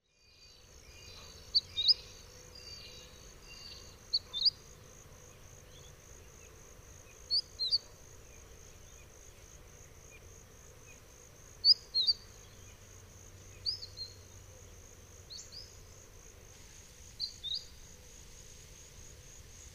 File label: Mistletoebird